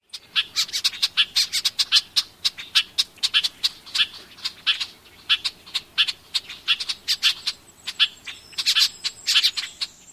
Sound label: alarm calls of pair; NY